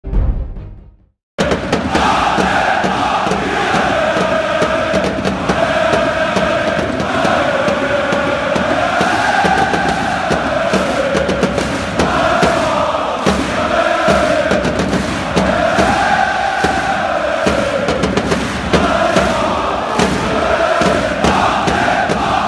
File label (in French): Allez Paris Allez Paris